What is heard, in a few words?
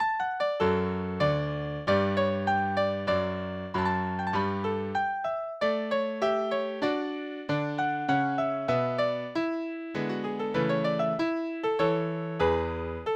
waltz